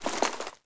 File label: saw inspect small, weapons